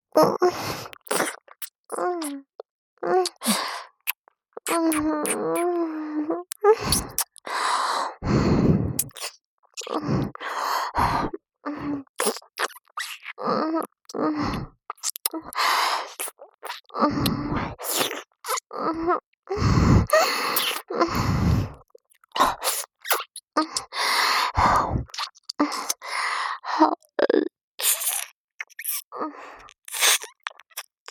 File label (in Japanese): 先輩保健委員の右耳舐め; SEあり